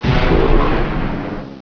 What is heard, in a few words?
Die